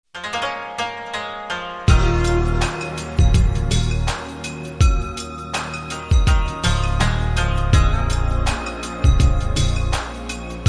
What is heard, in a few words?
Koto Ground Beat